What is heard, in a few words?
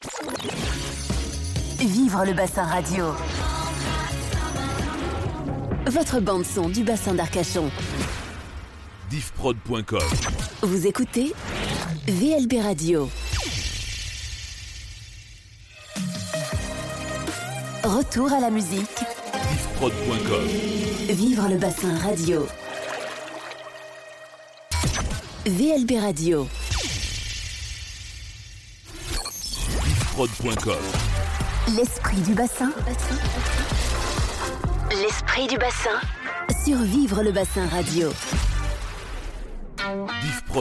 Radio Jingles; Vivre le Bassin; Radio Arcachon